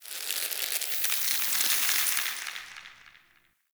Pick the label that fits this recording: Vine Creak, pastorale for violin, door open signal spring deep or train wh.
Vine Creak